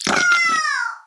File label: virus dead; kill the virus